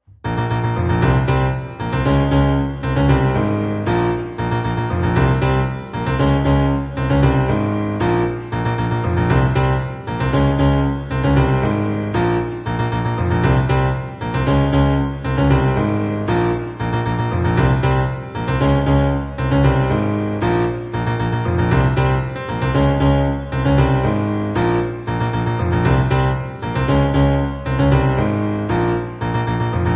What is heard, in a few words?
Piano Cover